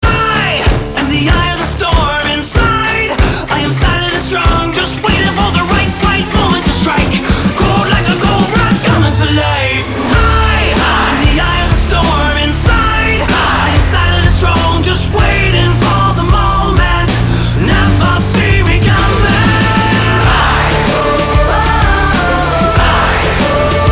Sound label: Modern Alt Rock